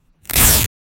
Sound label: sound of a, office supplies